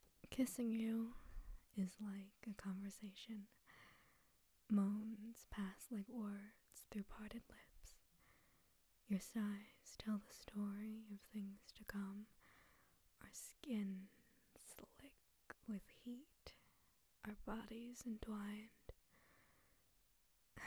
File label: Kissing; F4A; Conversation; Erotic poetry; Desire; Lust; Sensual